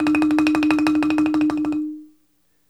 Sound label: BALAFON D; E-MU Producer Series Vol. 7 – Old World Instruments